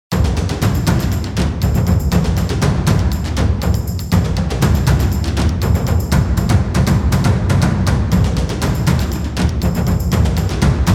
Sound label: cinematic groove